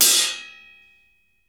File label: RIDE CHOK, RIDES; Sampleheads; New York City Drumworks VOL-1; SP RIDES